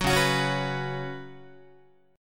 C sharp 7th Suspended 4th C sharp, Guitar; C#7sus4; strummed; JGuitar

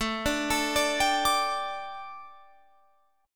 A 7th Suspended 4th, A, down, Guitar, Open F; A7sus4 arpeggiated

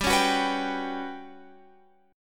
G Major 7th Flat 5th, Guitar Open F; GM7b5; strummed

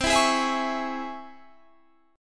C sharp Minor Major 7th, chord

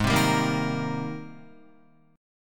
Ab Major 9th Ab down Guitar 4E 4A 5D 5G 5C 6F; AbM9 strummed; JGuitar

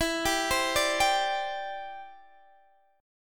E Minor 7th Sharp 5th, down, Guitar, Open D

